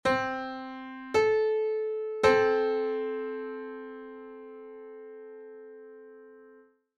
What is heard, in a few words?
Piano 11 15edo